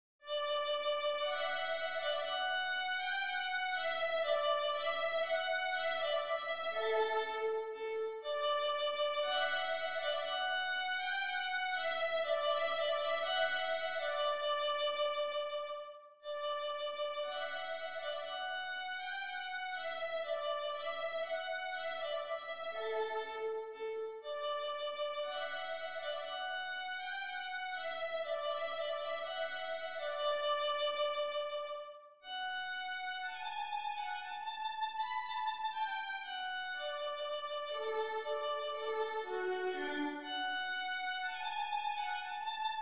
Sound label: trumpet voluntary; clarke jeremiah